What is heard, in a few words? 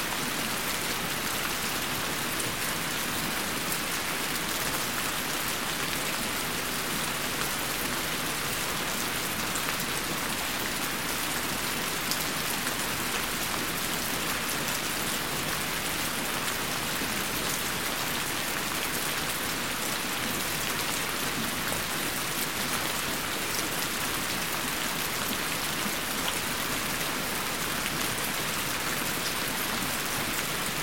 rain